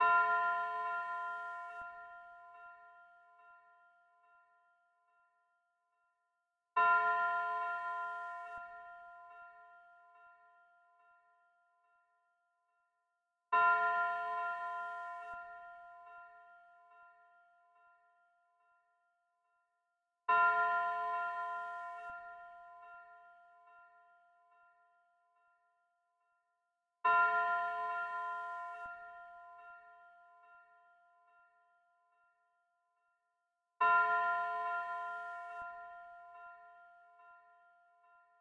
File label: 142 BPM F Min Bell; Melody; Loop Stems; Cymatics; Missed Payments